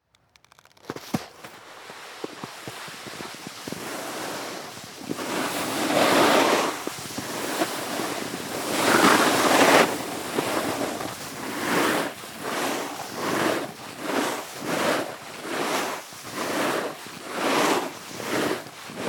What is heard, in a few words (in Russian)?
Snoubord katanie pryzhki